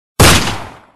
Text Tone 9mm

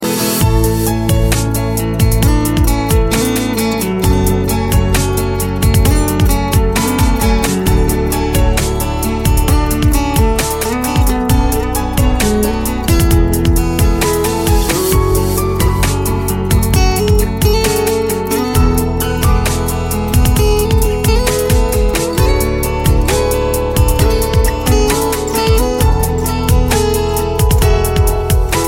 piano and guitar; ringtones; latino

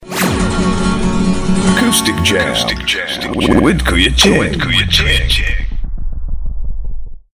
Accoustic Jam

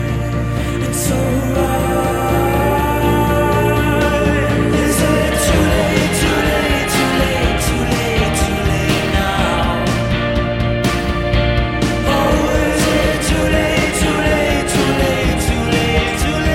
Rhodes

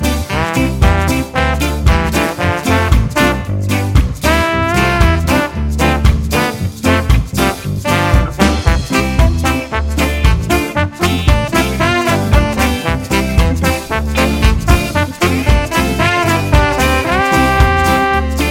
Ska

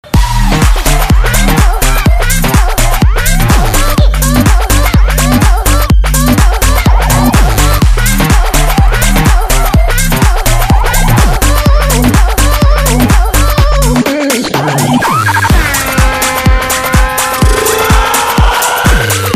DRUM

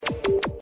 short signal

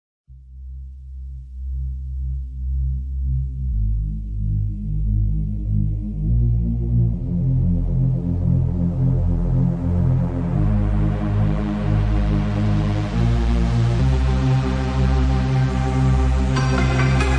dubstep remix, ringtones

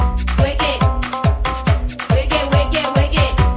sms

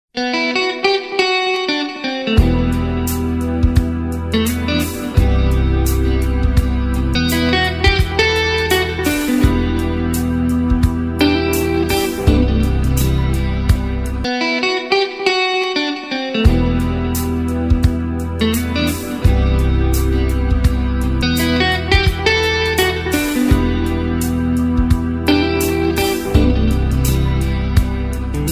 soft electric guitar

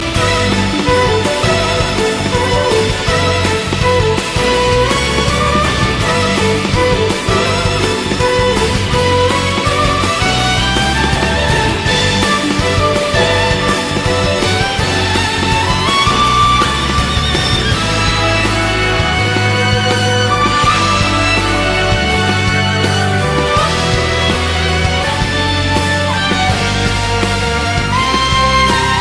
battle

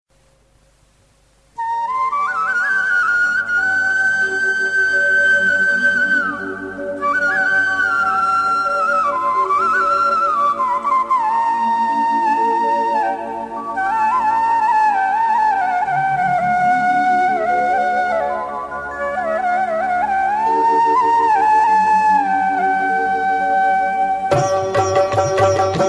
oriya tune

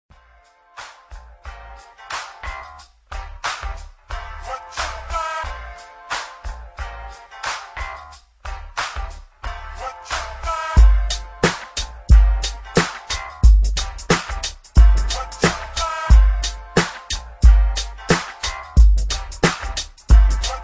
g-funk beat

